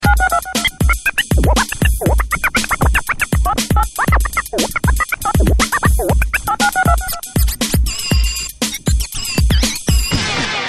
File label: bigbeat scratch